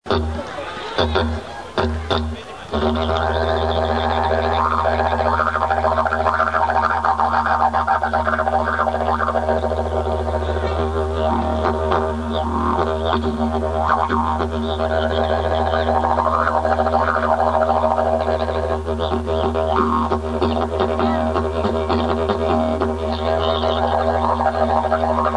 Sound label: didgeridoo; australie